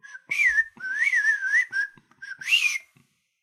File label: Whistler bird; SyrinScape; Syrinscape unplugged; Jungleplanet dinolaser battle